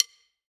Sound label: PERC DRY